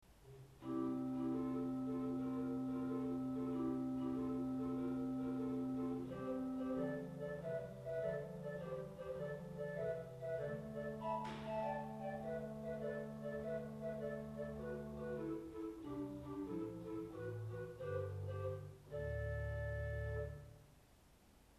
Gedact 8, Orgelstimmen; Orgelstimmen CD1

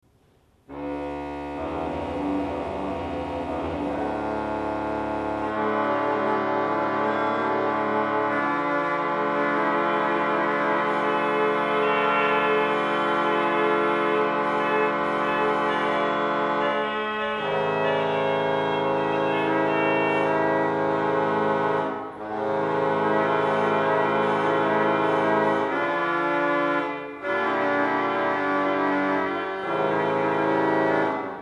Tromp 8, Orgelstimmen; Orgelstimmen CD1

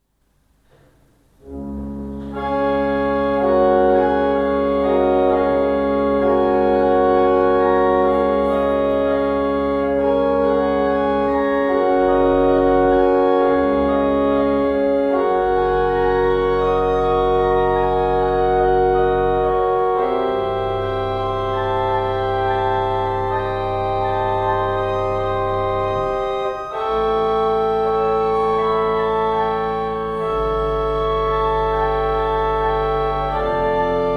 Octav 4

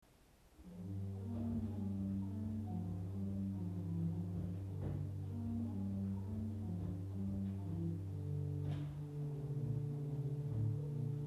Octavb 8, Orgelstimmen; Orgelstimmen CD1

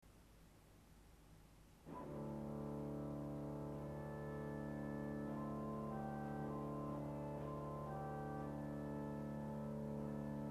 Aeoline 8, Orgelstimmen; Orgelstimmen CD1

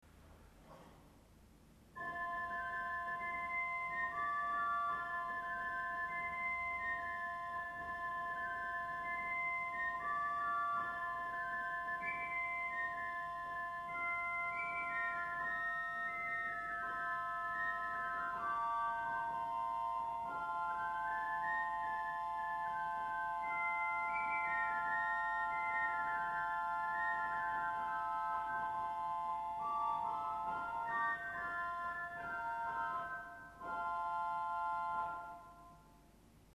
Rohrfl 4, Orgelstimmen; Orgelstimmen CD1

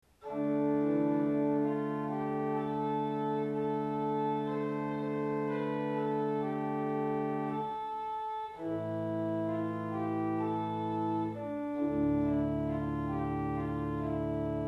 Geigprinc 8, Orgelstimmen; Orgelstimmen CD1

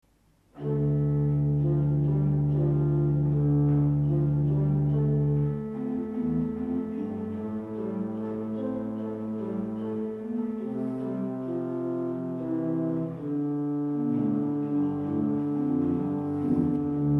Princbas 8, Orgelstimmen; Orgelstimmen CD1

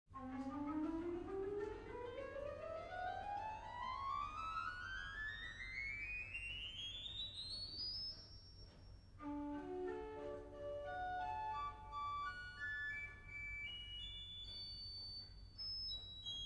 Oktav 2; Schultheis Schlimbach

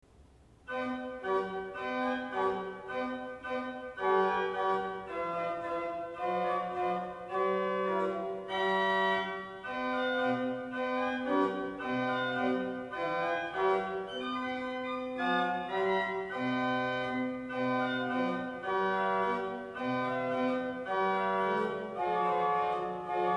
Octav 4, Orgelstimmen; Orgelstimmen CD1

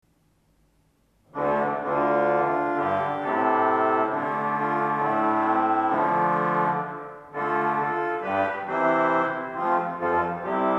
Tromp 8, Orgelstimmen; Orgelstimmen CD1

